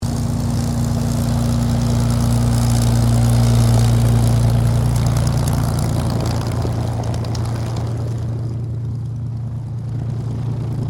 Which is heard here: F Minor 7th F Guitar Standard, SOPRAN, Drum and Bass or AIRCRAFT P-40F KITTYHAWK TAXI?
AIRCRAFT P-40F KITTYHAWK TAXI